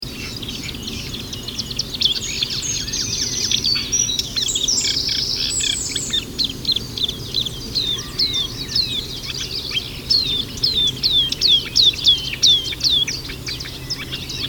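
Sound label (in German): Zaunkönig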